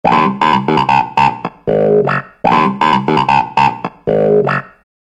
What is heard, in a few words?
clavinett